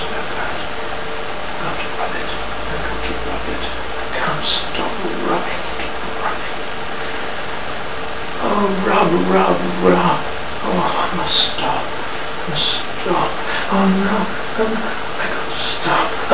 rub rub rub dont stop, Silly Lo-Fi Orgasms, VOCALS and SPOKEN WORD